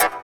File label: SYN WAH, dance synth; Sound & Vision - Gigapack I CD 2 (Roland)